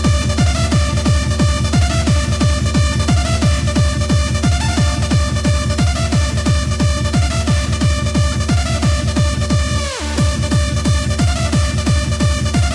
hardcore trance

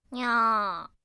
cat meow; trigun

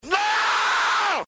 scream noooo; charles leclerc

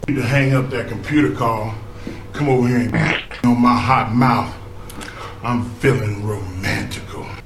computer call fart

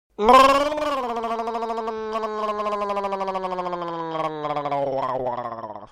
ahahahah

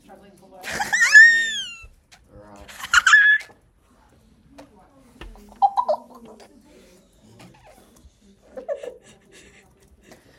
laugh

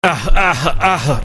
ahe ah

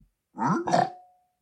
piglin

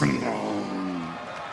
gurgle; taker

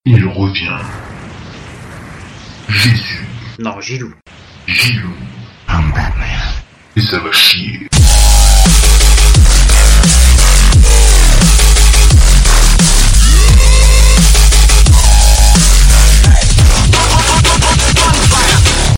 jingle

